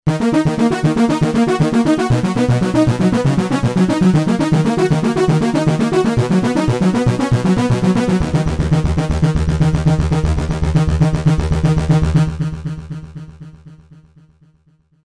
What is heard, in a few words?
supersaw